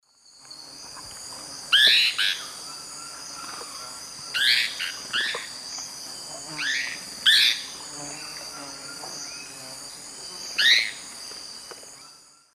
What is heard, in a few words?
Philohydor lictor, calls; S. America; Eastern Lowlands